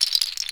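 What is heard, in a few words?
HUSK SHAKER, CAMEROON; AKAI S6000 CD-ROM - WorldSounds; CAMEROON PRC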